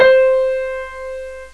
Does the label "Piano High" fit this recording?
Yes